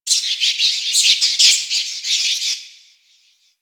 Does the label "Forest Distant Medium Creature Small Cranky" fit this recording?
Yes